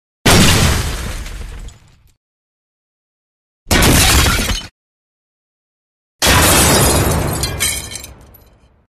Glass Smashes Heavy Single Heavy Glass Impact Shatte; Hollywood Edge Foley Sound Library